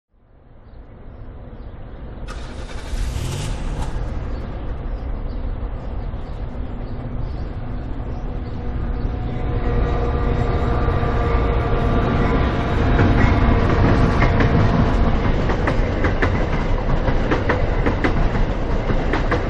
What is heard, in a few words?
metra